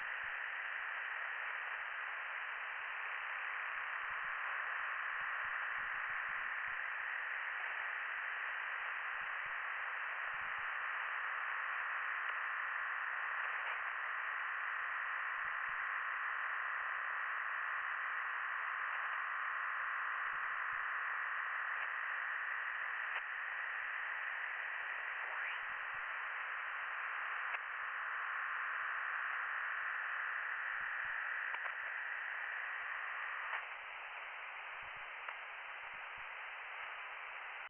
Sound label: from radio, 7177KHz